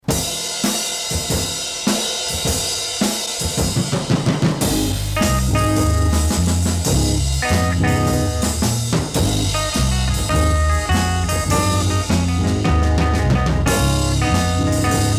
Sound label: Instrumental